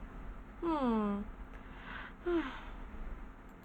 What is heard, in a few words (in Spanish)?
Aburrida